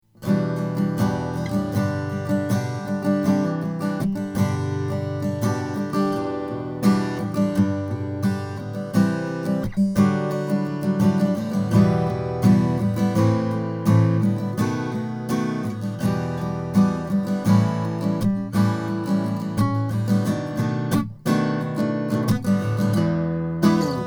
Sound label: santa cruz om honduran rosewood